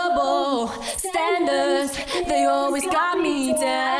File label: vocals; musdb18